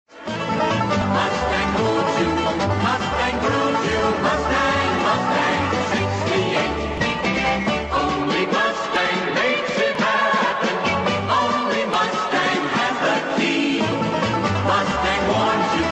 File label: commercial jingle ringtone; mustang